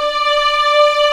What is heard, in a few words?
D5 LEG, String Collection; East West; The Ultimate String Collection